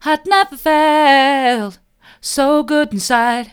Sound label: mid, vocal